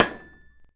Hit Metal Spike; Civ4 warlords

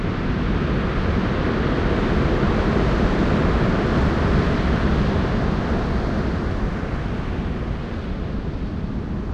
ambient port default; World Of Warships

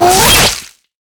bone headshot

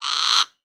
Amb Animals; Red Orchestra Ostfront 41-45

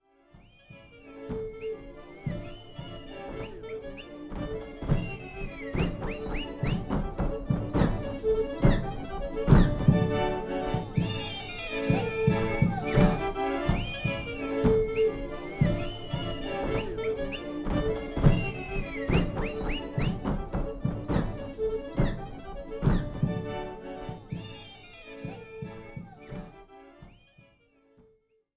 dance; hangar ny 2017

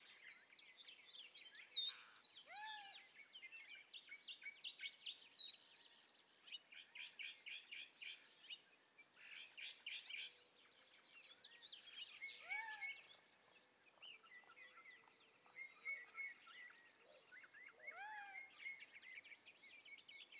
ambience birds chorus; Rust

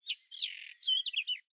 ambient; World of Tanks

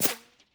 bullet whiz; Escape from Tarkov

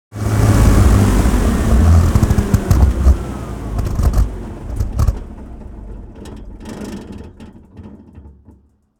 engines; World of Tanks

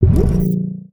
menu enter

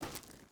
footstep hide gravel walk; Rust

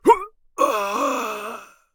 death; Escape from Tarkov

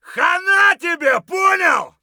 fight; Escape from Tarkov

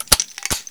Inf Weapons Foley 3rd; Red Orchestra 41-45

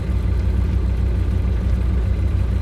yak cockpit, engines; War Thunder

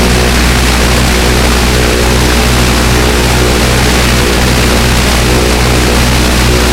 blenheim side, engines; War Thunder

